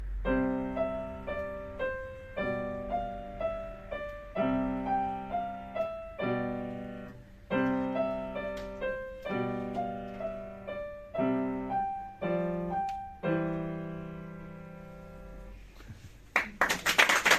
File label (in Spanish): tocando el piano